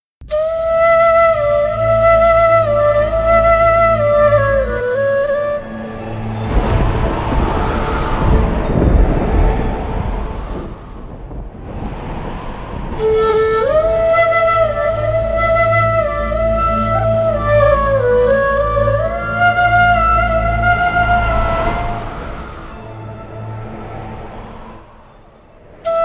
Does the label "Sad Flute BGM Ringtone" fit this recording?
Yes